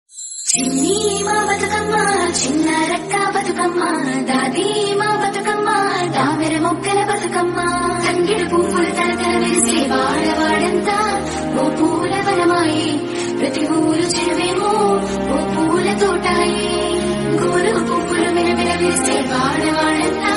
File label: bathukamma bhakti ringtone; v6; surya